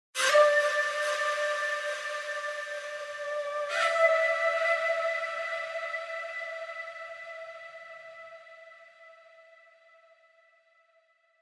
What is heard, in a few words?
Lakeside Relaxation audio atmosphere